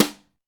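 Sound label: Snare Brush, Alesis D4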